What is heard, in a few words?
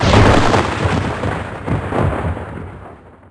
lightning strike; Tanks